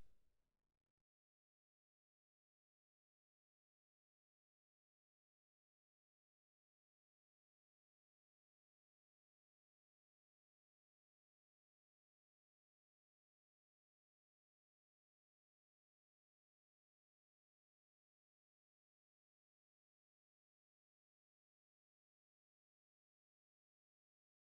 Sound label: snare